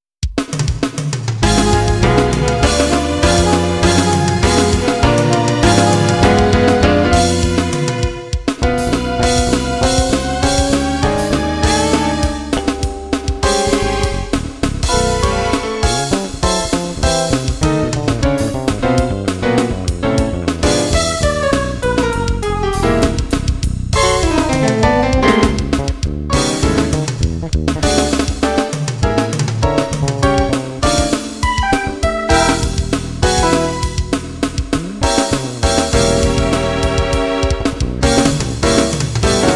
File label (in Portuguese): jazz violao